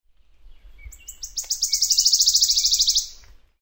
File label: wood warbler; grodek